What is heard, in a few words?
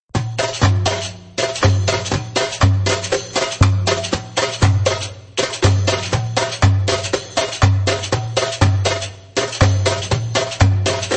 Reggae Beledi (fast)